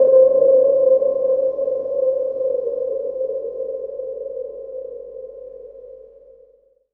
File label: KEYS Odyssey (C), One Shots; Cymatics; Mystery Pack Vol 4